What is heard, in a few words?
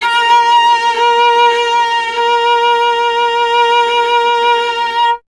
viola A#; Miroslav Vitous; Solo Instruments VOL-1